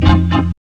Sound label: ORG RIF